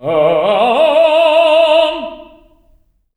TENARP E3, tenor arp, voices

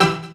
DR. LOOP; X-Static Goldmine 3; CHORDS

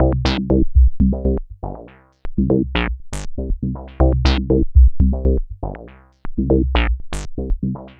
Bassline, Prototechno

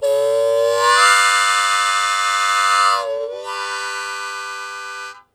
Blues Chord Attack G1, Harmonica; Zero G - Harmonica

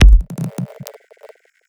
Lat, Kik, One Shots; Sonic Foundry (Sony Creative Software) - Future Retro Dance Excursions

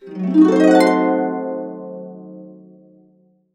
C DIM7, Complete Harps; USB Soundscan vol.62; AKAI

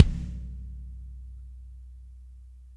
CMP KIK, KLANKY KIT; Spectrasonics; Burning Grooves CD2

